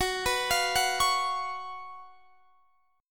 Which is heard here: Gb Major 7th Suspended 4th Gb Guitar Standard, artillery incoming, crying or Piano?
Gb Major 7th Suspended 4th Gb Guitar Standard